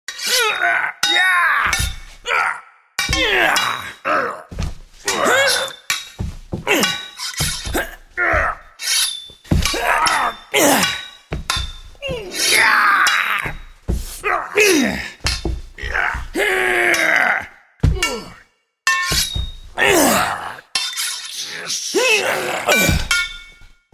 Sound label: Two knights settling their differences by having a sword fight with vocal sound; sword fight with vocal sounds; Swords; Combat; Battling Knights